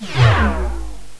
vwoom amp; matrixminer

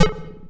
Arcade beat amp; packetstorm

